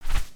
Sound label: Wing Flap; creatures organic